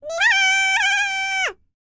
BLACK SEA, World Voices; ILIO; Vocal Planet VOL-5

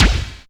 Strange Kicks, Percussion; Dance Synths

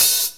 TICTALK DRUM

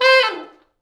T Sax falls, Horns Sax

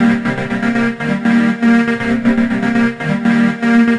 Progressive Synth, Trance

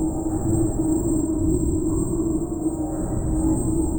Sample n Hold Verb Loops, sparse soundscape; SnH Verbs

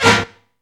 HITS STABS; Zero-G; Funky Elements

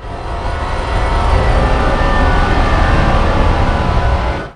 FX SWEEP

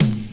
toms, disco; Best Service ProSamples vol.48 - Disco Fever; AKAI

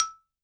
balafon, e4; World Instruments 2; WORLD PERCUSSION; PS BALAFON

